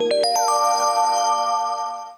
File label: SMS in; HP iPAQ Glisten